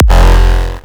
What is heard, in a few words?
hardstyle f reverse bass